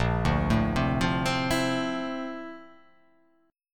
A sharp Major Sharp 11th

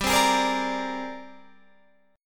Ab Major Sharp 11th G

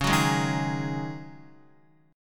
Db Suspended 2nd Suspended 4th Db, Guitar; strummed; JGuitar

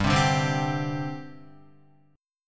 G Suspended 4th, Guitar, Gsus4 chord; strummed; JGuitar

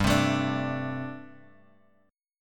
G Suspended 2nd Suspended 4th, Bass Standard; strummed

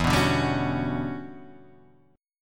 E Minor 13th guitar chord, Half Step Down

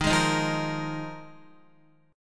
Eb Suspended 2nd Suspended 4th, Guitar; Ebsus2sus4 strummed; JGuitar

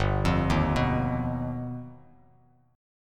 G Augmented 7th, Bass Standard; G+7 arpeggiated; 3,8,1,4; JGuitar

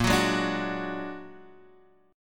A sharp Augmented 7th; A#+7 strummed; x,1,4,1,3,2

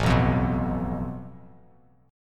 A Suspended 2nd Sharp 5th, Bass Standard; strummed; JGuitar

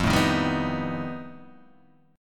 F 9th Flat 5th F down Guitar Open G

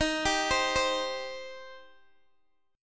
D sharp 6th D sharp Guitar Open D

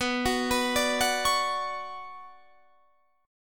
B Minor Add 9th B, chord; Bmadd9 arpeggiated; madd9:1,b3,(5),9; JGuitar

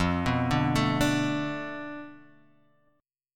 D Minor 6th F, guitar chord

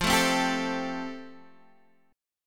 F Major Add 9th Guitar Half Step Down

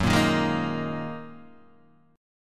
Gb 6th Add 9th Gb down Guitar Open D

